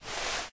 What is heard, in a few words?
wall slide